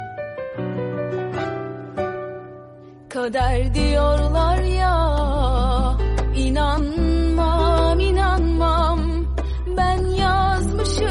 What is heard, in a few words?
turkish super